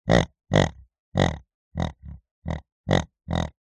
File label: pig grunt designed; animals